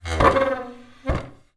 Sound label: household wooden chair drag solid tiled floor